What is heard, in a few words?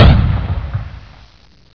laser